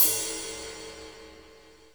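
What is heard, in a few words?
cymbal, right; Best Service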